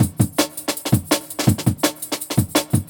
165, Jungle Breaks; 90s Sample CDs; Original Jungle Breaks

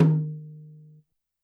Tom Brush, YAMAHA RM1X

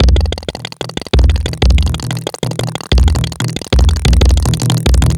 Buried Code Groove; Extra Tweak Grooves

